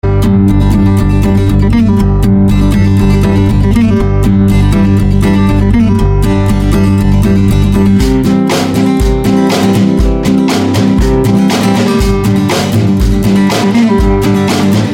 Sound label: Rock Country